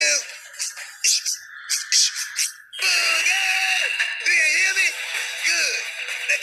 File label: beatboxing boogie